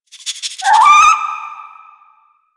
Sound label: Rabbit Ambient Call; Duviri; Animals